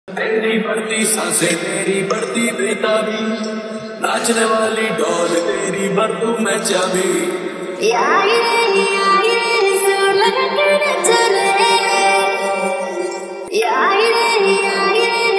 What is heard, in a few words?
lofi remix, hindi ringtone